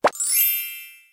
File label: monster